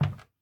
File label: Wood soft